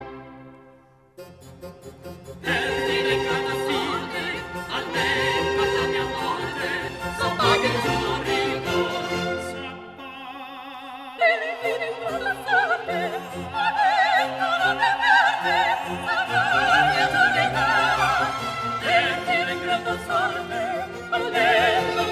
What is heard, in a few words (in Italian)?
soprano; Bellerofonte; terz finale III